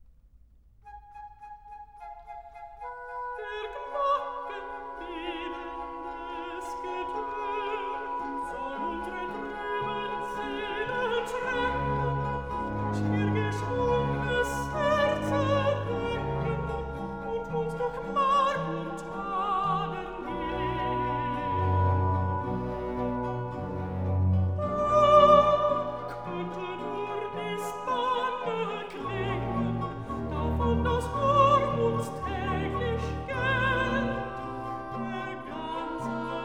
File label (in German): Rezitativ; Bach; Cantatas BWV 106, 118-231, 198 - Gardiner; Lass, Fürstin, lass noch einen Strahl; Der Glocken bebendes Getön